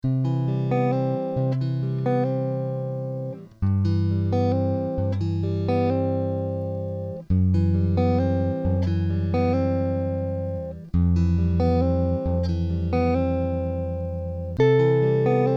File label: Acoustic Guitar, composition acoustic guitar